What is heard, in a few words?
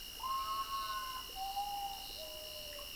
Common Potoo